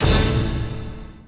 Final Battle - Combo Stab

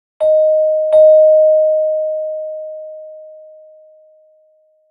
Marimba; arc apellago

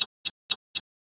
last 10 sec

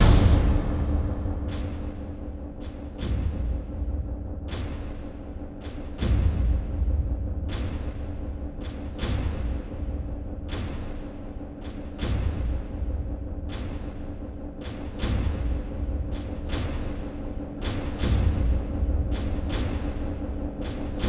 AMB DRUMS; gamerip; MEGATRONSPOWER

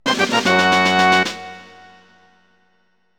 Weapon Upgrade; donkey kong 64

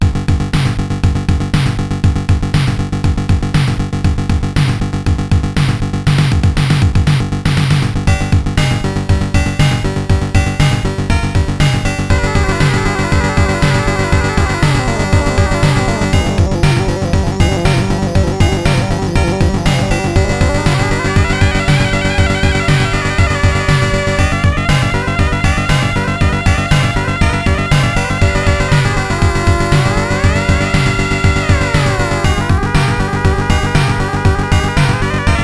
Bonus Table; Devils Crush

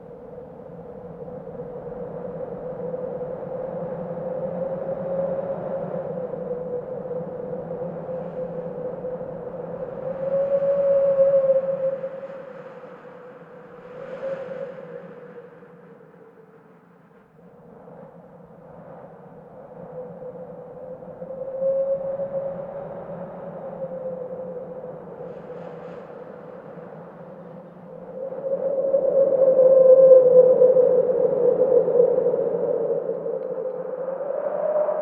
howling wind looping

